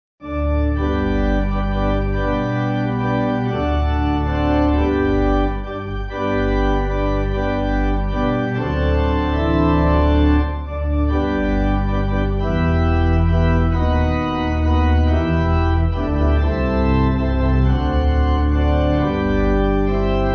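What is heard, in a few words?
Pipe, Pipe Organ; Sankey; I.D. Sankey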